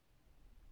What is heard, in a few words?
ring tones